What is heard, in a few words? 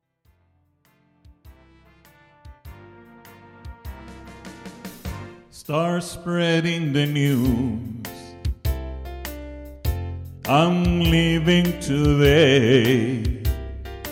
with voice